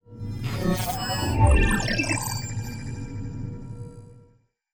hologram generation; rifts; Stellaris; astral planes

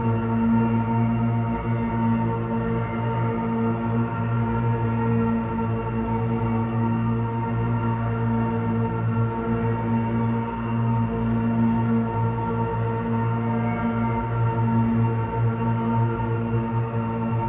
ship construction ship idle; Stellaris; shroud

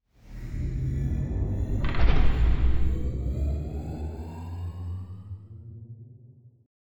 ship colossus open; Stellaris; shroud; ships

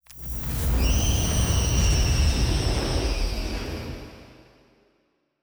wailing alien; rifts; Stellaris; astral planes

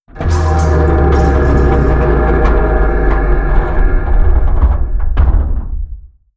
ambient fallen empire titan death; Stellaris

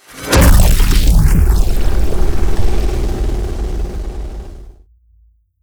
assimilate hit layer; Stellaris; apocalypse

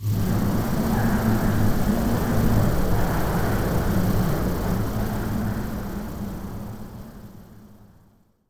living wind; rifts; Stellaris; astral planes

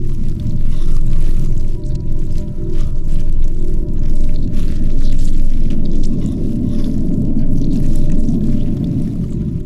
ant ship colony idle; Stellaris; biogenesis; ships

